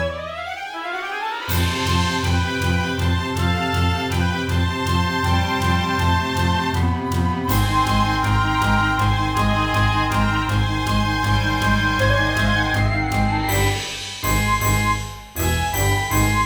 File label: Battle Bgm; Airship Q; PS Vita; Empress Battle Bgm